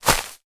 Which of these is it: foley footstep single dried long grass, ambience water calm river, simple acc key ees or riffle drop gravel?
foley footstep single dried long grass